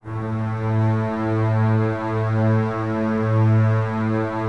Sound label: Basses A 2, String Ensembles; Miroslav Vitous; LINKS ORCH